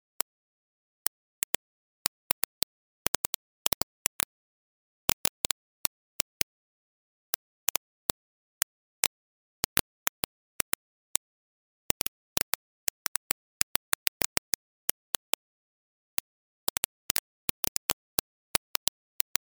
geiger

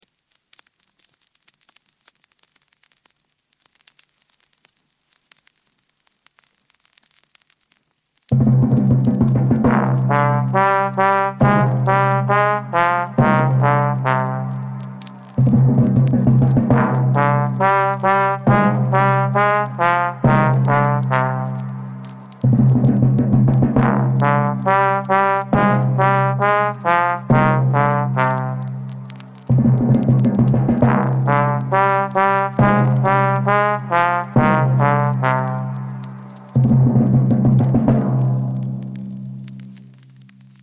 zurna balkan; survival sound

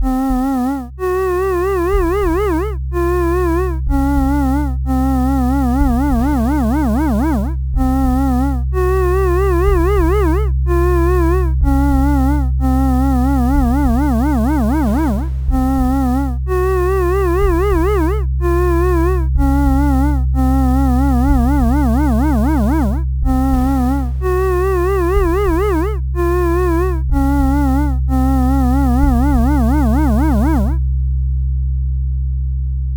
dark 2 triton

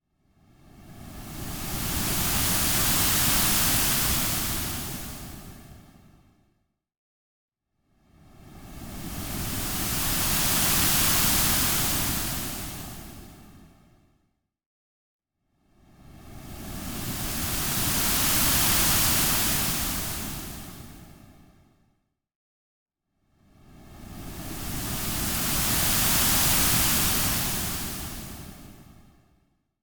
shore

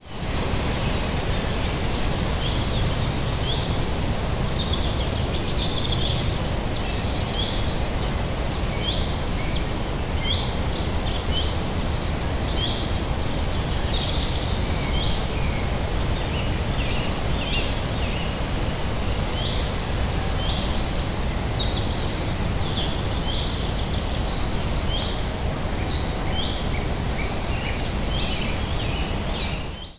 real forest

